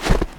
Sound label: player snow; svencoop